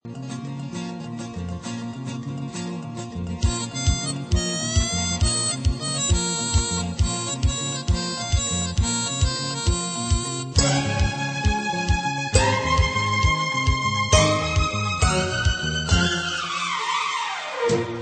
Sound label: Flute Music